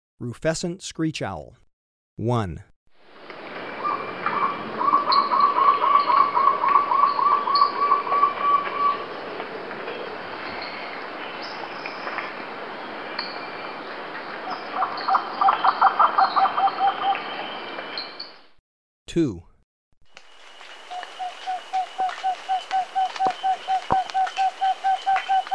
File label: Rufescent Screech-Owl, Birds of Ecuador; S. America